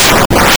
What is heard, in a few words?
cut, attack sound; カタナ１